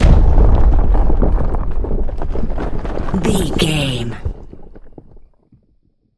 rock avalanche landslide debris

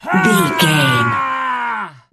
voice male battle shout long

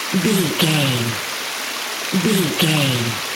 river stream flowing water loop short version

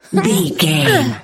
voice female effort quick action

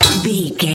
metal lid movement impact

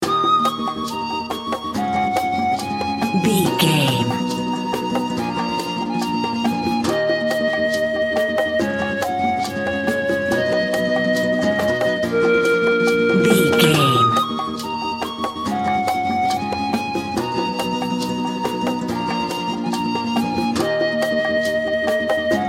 Peruvian track; Video Game

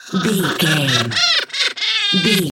goblin fairy laugh angry

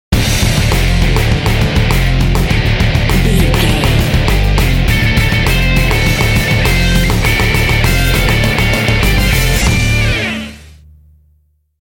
modern heavy rock stinger

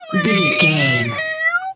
cat meow long

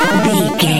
retro teleport warp effect

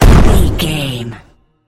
gun tank cannon turret shot

